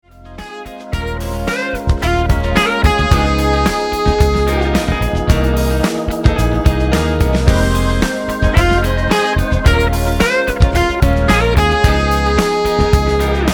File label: kind of blues